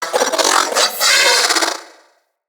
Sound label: Riot Moa Vocal Attack